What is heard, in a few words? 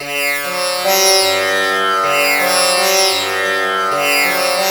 TAMPURA; Spectrasonics; Heart of Asia